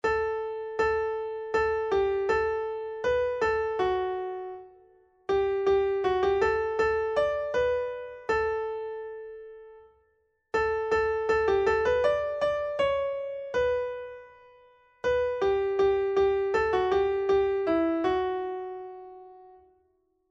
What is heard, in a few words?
tenor midi; oto Pan Bóg przyjdzie